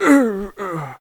Human Old Die; The Battle for Wesnoth

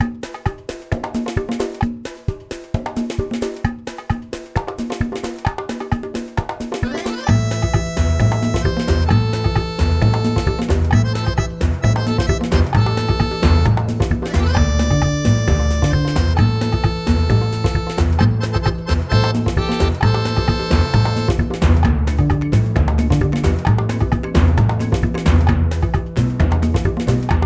music loop

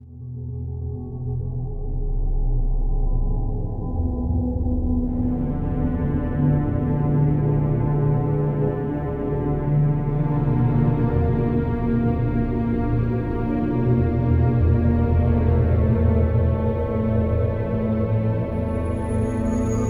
Interior; Knights of the Temple II

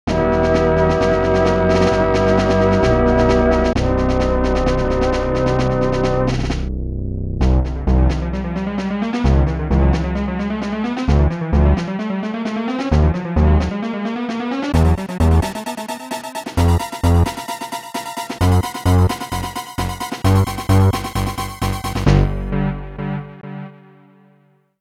Continue (Instrumental); Super Turrican 2; Turrican Soundtrack Anthology Original Sound Version Vol. 2

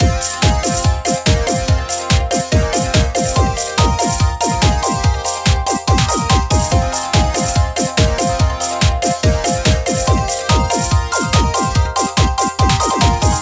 Game Clear; Sea King Hunter

